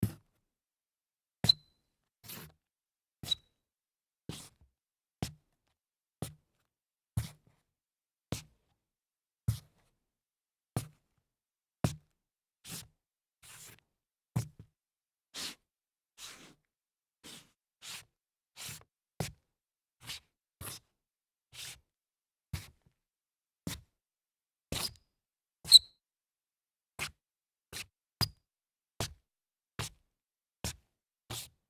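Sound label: sneaker scuff hollow wood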